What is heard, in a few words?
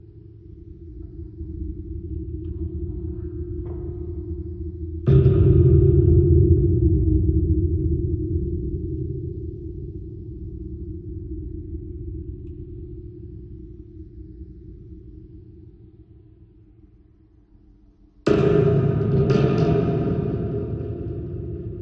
Metal Ambience LOOP; unrest indigo